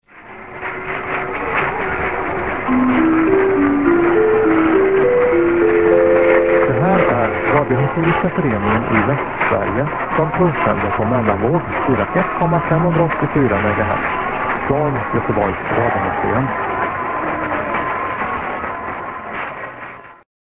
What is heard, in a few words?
aircheck